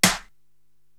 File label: SD Compressed Hop, Korg TR-Rack drum machine